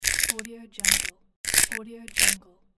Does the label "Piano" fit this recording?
No